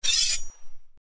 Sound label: extracting a sword; jidaigeki